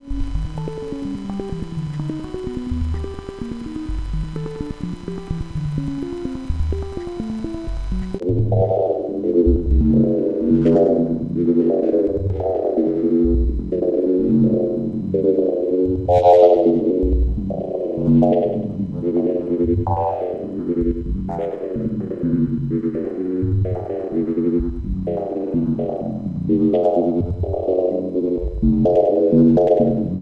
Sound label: Polivoks LP res lfo fm dly